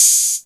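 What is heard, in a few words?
hi-hat, Drum & Bass, 160 BPM; Ueberschall; Drum & Bass Flash Trackz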